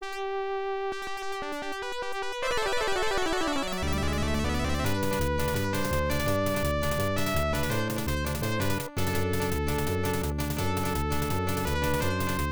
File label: Game Set (Won); pro yakyuu family stadium pennant race; msx2; msx-audio